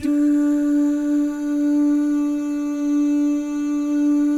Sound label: DOO MIX, Vocal Planet Jazz, GUY GAL; ILIO; Jazz & FX